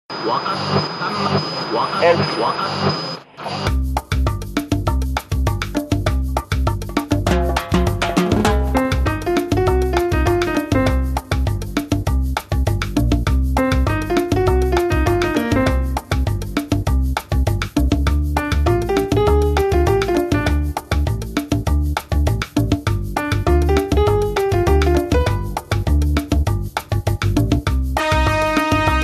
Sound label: bossa